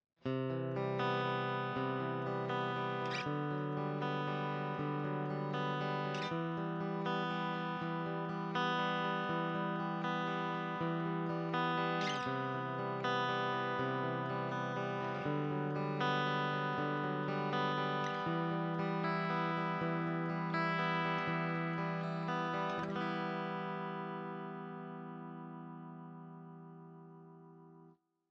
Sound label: Original Sound